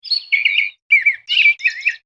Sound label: call mail; HTC; Infobar-A02